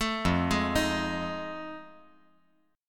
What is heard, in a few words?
F 7th Sharp 9th F; 2,5,4,6